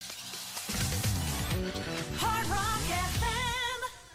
hard rock

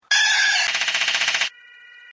banshee jumpscare

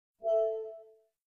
windows 7 sound effect

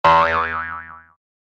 boing

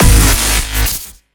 digital impact hit

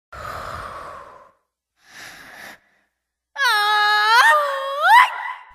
scream; coco

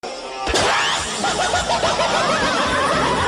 laugh

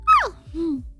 yawn

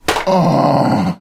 sus groan

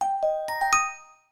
transformation; vivo

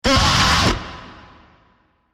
jumpscare; medic

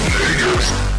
neck hurt

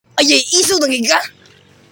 ayay

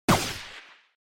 block sfx; blade ball

